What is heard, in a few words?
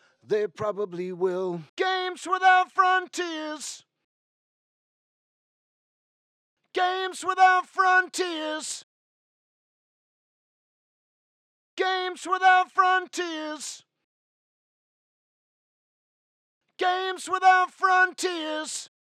vocal; Summer X Games